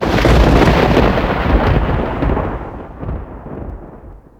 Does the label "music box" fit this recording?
No